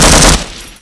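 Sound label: grunt machine gun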